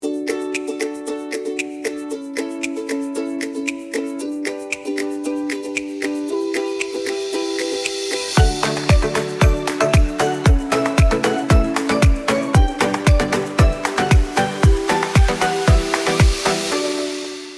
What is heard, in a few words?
music